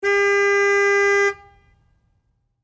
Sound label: Truck GMC Savana Horn Long; 2010 GMC Savana; Trucks; Vehicles